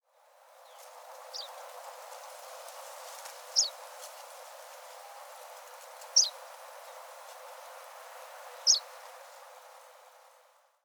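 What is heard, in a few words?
Pallas's reed bunting, hyogo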